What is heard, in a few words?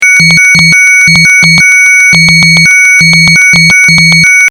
fm synths